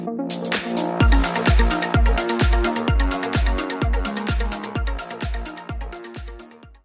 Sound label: followers alert beat